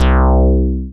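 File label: synth analog; Best Service Gigapack 1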